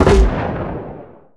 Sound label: grenade launcher; cannons tanks